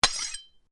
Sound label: Blade Swish Low, Sword